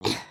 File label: sleep turn; emotes